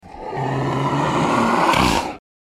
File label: lizard dying